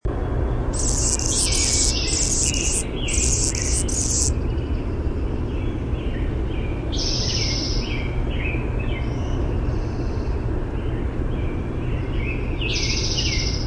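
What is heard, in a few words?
song, Anna's hummingbird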